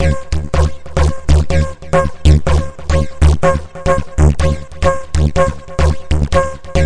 140 hexbeat, drum loops and breaks

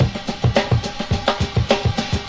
105 bpm, drum loops and breaks

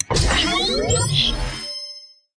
space alien weapon powerup; Computery or Science Fiction

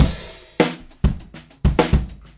101 mute the drums; 101 to 110 bpm

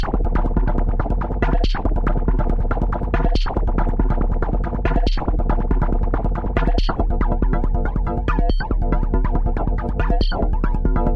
140 bleepy-roller, synth and electronic loops

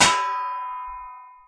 gongi; Clangy Crunchy Foundsound Kit

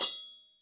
chappa, Chappa and Chanchiki Cymbals; ETHNIC and WORLD PERCUSSION

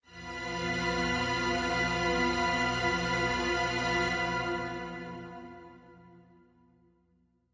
Heavy Strings F; PADS and STRINGS

